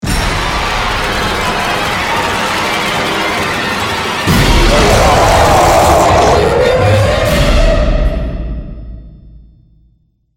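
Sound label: Music Death By Snowbeast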